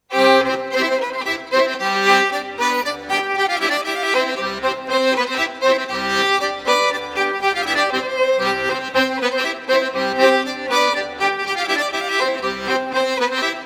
Polka; Polka de Lamarre; noels anciens et airs profanes

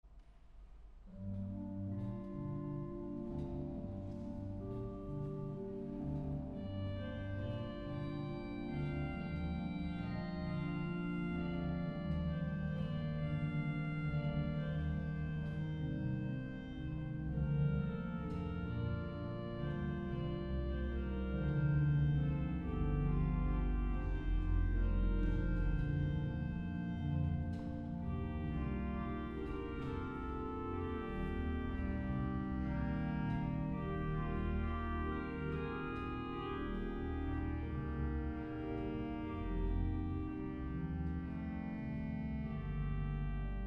sicilienne; DURUFLE

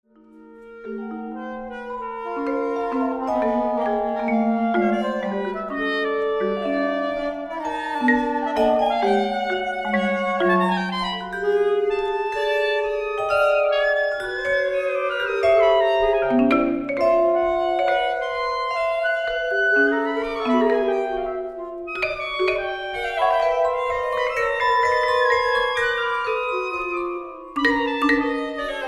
sax

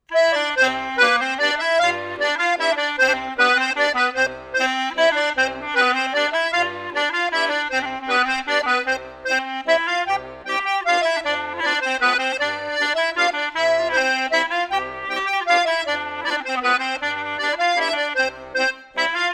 Mazurka

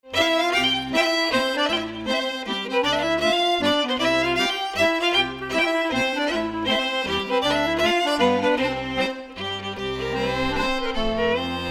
Mazurka

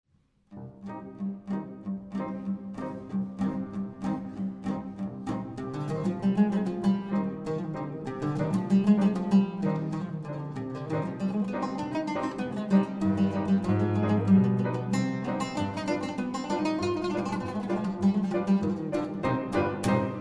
Longa nahawand

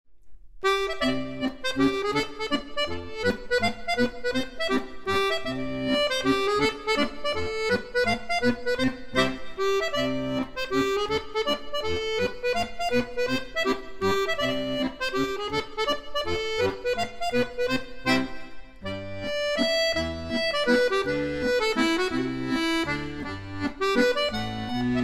Mazurkas

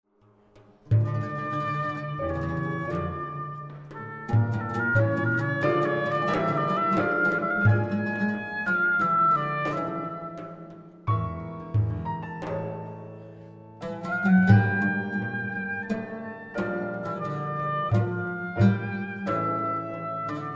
Samai Hijaz

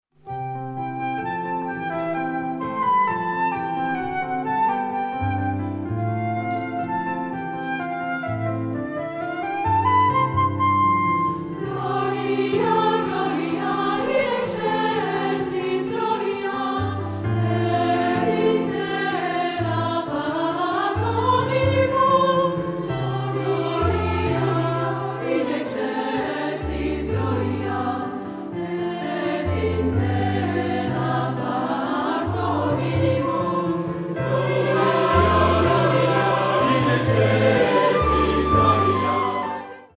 Gloria canon

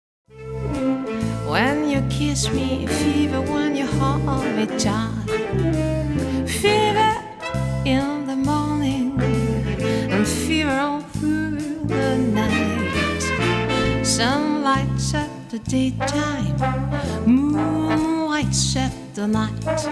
jazz

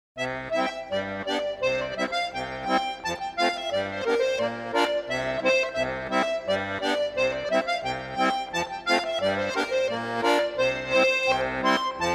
Polka; Polka à Michollet

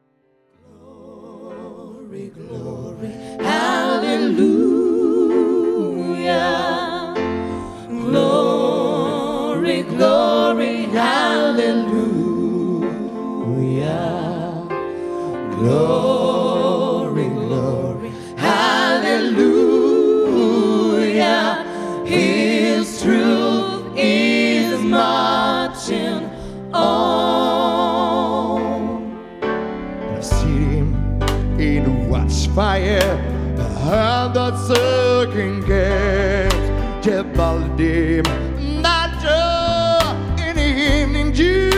jazz

